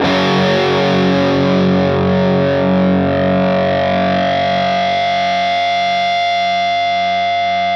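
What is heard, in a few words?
fuzz guitar vibrato; MAYFUZZ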